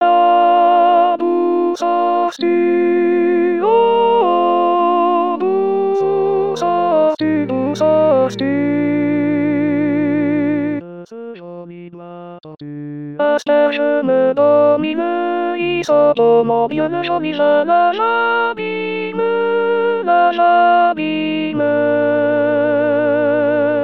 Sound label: alto